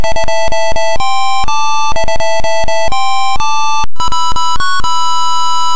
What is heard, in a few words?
Fanfare; Commando. Fanfare (CPC); CNGSoft